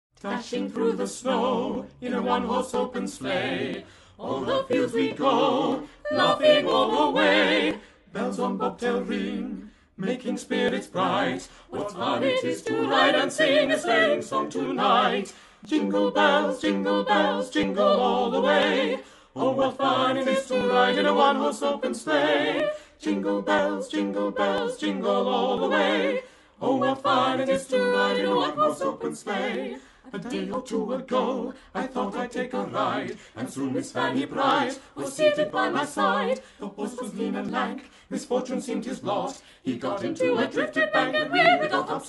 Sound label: Jingle bells